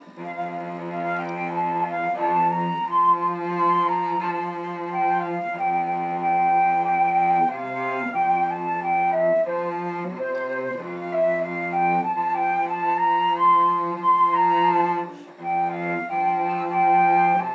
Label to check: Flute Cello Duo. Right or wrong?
Right